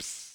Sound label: hi-hat, Millenium MPS-400